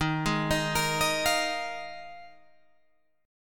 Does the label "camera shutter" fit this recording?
No